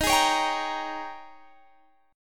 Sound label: G sharp Major 7th Suspended 2nd Suspended 4th